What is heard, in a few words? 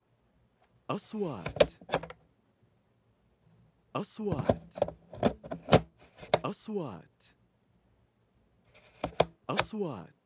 lens put lens for camera and take it of; shooting stuff camera box